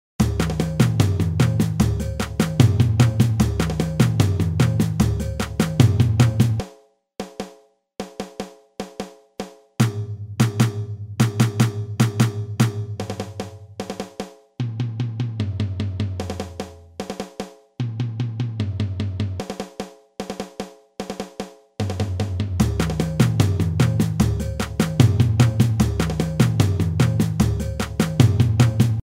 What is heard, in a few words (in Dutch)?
break samba reggae